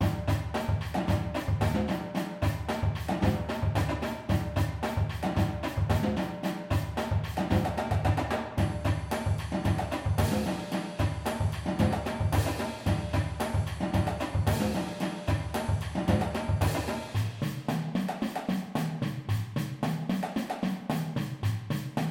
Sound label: full drumline